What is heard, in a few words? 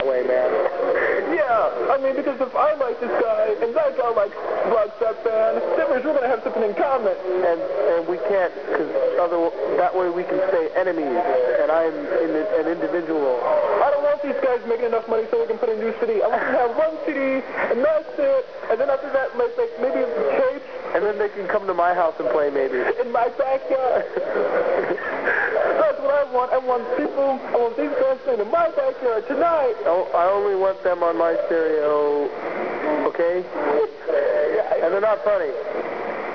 ska